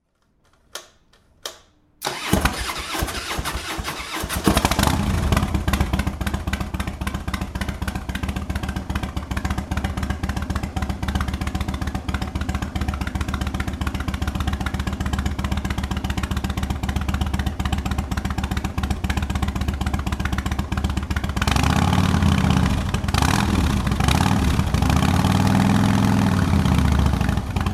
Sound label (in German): Start einer Harley Leerlauf; Aufnahme Florian Kochon und Nicholas Dahms; Harleyprojekt